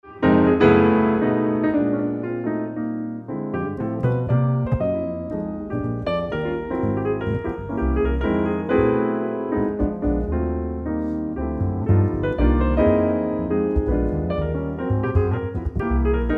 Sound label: ewi piano duet